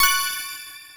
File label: score up